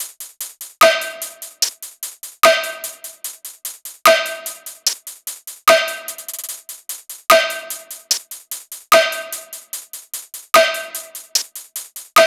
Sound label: tops 148, Drum Sample Pack, Loops, Top Loops; SD SEISMIC; Slate